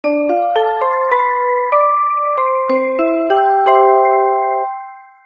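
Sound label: music fun vibration, notifications; Samsung GT-i7680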